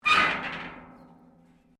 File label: METAL RUSTY SCRAPE